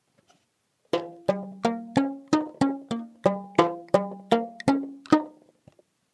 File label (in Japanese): wagomu karinba sen